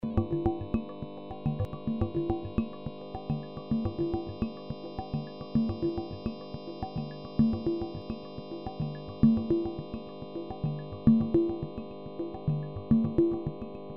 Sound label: Vowel example